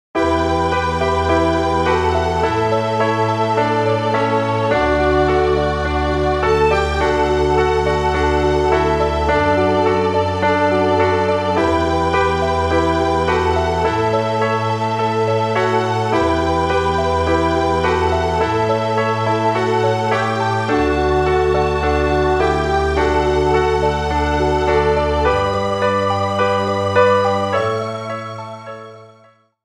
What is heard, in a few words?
backing